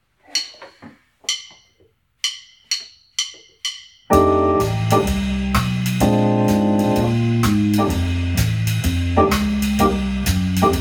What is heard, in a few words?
F Blues full backing track